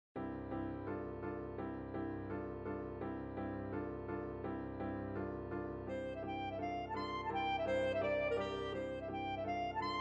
e flat clarinet; HOLMES Augusta; Three little pieces 3

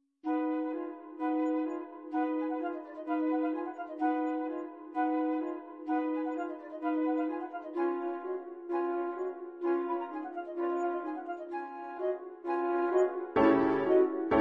Flute Quartet w Piano; Tuilleries; Pictures At An Exhibition

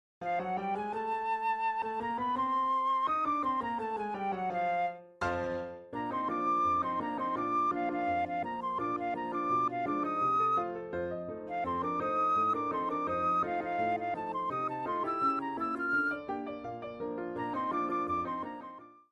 Ragtime Dance Intro Theme A

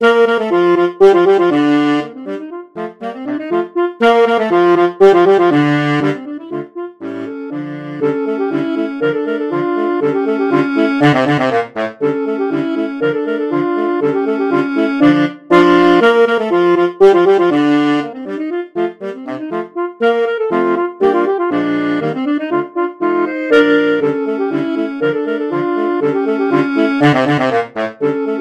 Reapers Dance, sax quartet

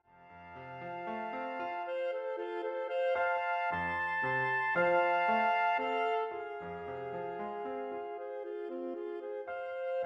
Flower Duet Piano Conclusion; Delibes; Saxophone Duet

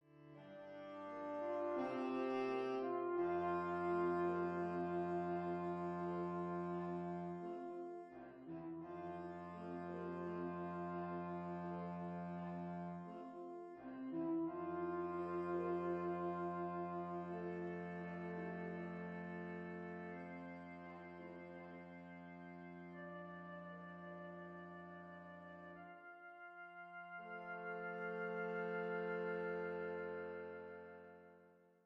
Humming Chorus; Puccini

